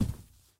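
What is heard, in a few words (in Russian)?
далекий частый звонкий салют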